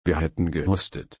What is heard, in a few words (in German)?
husten